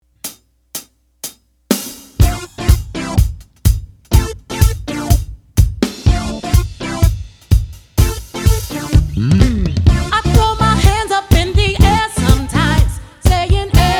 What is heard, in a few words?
Wedding Band; Philadelphia; weddings